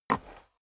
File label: step boots wood creak single stair up; schritte